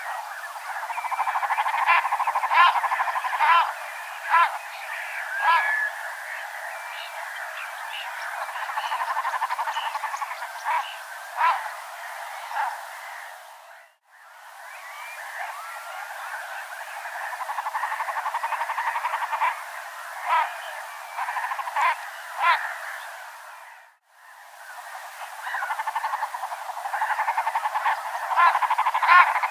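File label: peganka golos, common shelduck voice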